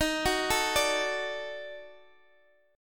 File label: D sharp Minor Major 7th Flat 5th D sharp down Guitar Drop D